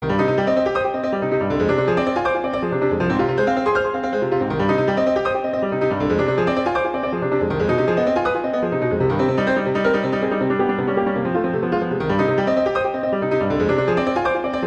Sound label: Etude, Allegro molto con fuoco; Chopin; Etudes; Ocean